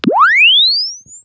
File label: Press Two Sides Chirp; Samsung 4 Recordings